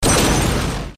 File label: summon gun effect; persona 3